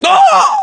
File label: pain; terror